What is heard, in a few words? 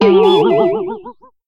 stun hit